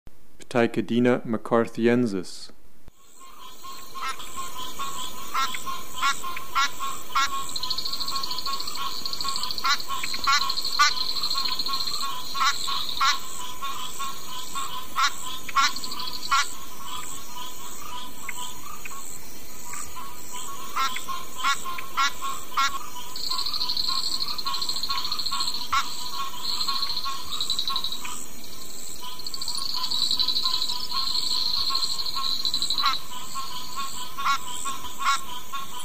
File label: Ptychadena bibroni